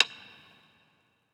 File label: stick; eddyflux